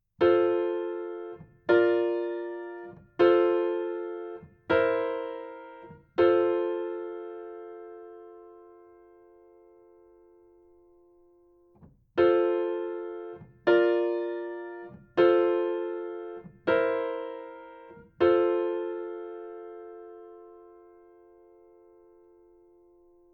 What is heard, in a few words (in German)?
Kadenzen in F Dur Übung